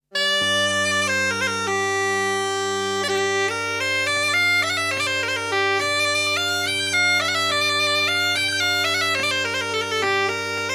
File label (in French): Bourree; Bourree d Aragon